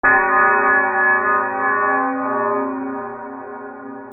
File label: BELLS